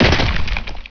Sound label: gib, gibs; quakemapping